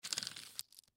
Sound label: Keychain Mechanical Retractable Retract; Machinery; Blastwave; Foley